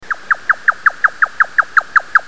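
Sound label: grey nightjar; yotaka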